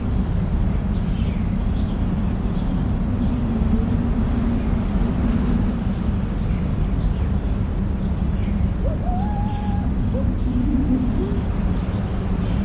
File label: Wind forest